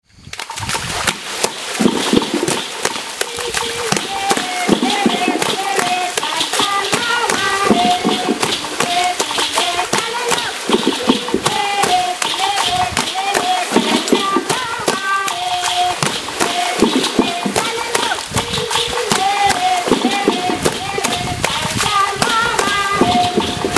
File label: Vanuatu Water Music